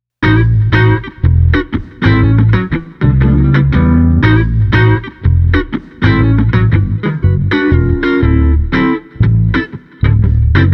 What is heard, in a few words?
Street Guitar Bass Bouncy Blues 120